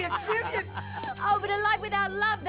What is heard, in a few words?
female laugh